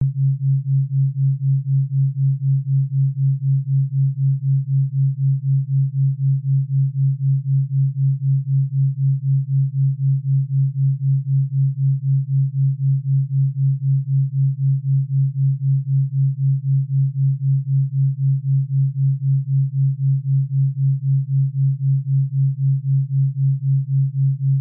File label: low theta, binaural beats